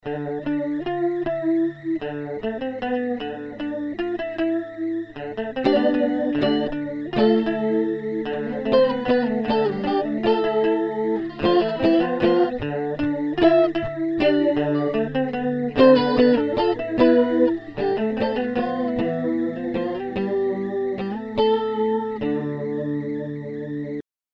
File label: Fender Bubbler Chorus